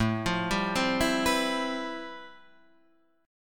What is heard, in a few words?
G 13th A, chord; G13/A arpeggiated